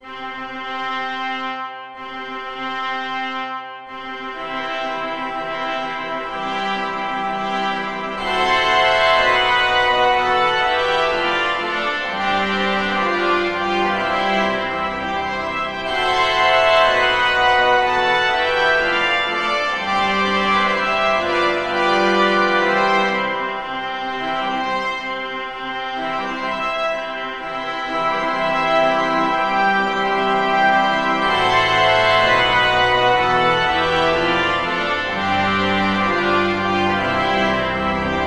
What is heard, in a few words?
wedding march per 2 trombe; mendelssohn bartholdy felix; trascrizione pratica; e strumento da tasto